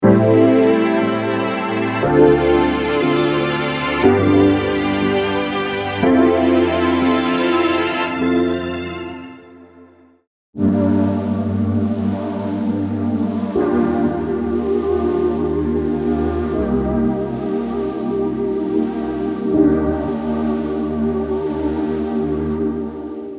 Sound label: Chords Lo Fi